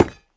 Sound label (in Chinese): 下界合金踩踏声